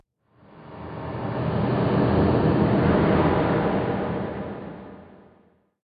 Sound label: echoes; thief 2014 gamerip